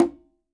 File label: QUINTO, Percussion